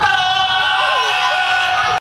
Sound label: seal screaming; animals